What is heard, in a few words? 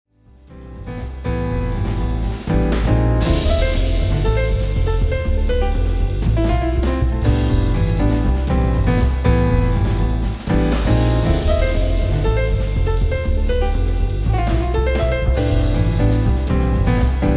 jazz